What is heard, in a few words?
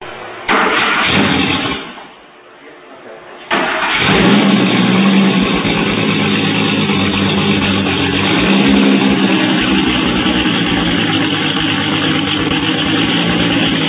starting